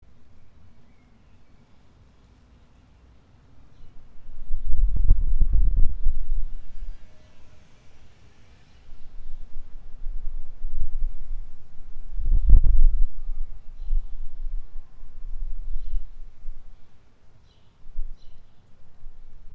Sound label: Internal Mics Outside; Tascam Portacapture X8